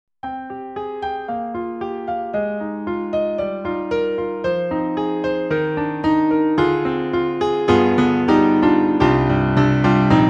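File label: SD-90 Contemporary Acoustic Piano; EDIROL; Ac.Piano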